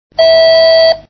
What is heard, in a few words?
bleep